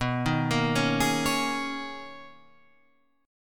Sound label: B Major 13th B Guitar Half Step Down